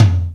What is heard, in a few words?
TICTALK DRUM